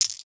item stone; RPG

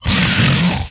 Inhuman Growl, Vocal; retro arcade; MMRetroArcadeSoundsPack

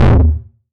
explosion quick

